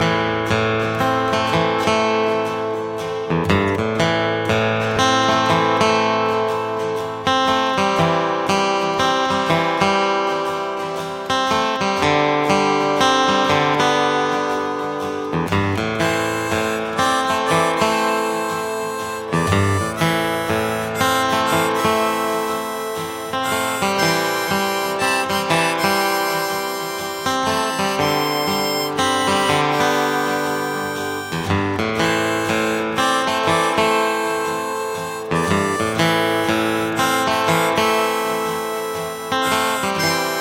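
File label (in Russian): зацикленные мелодии для гитары loop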